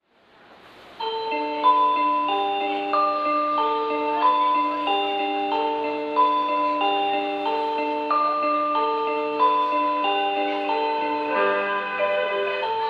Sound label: akabane melody; jreast; utsunomiya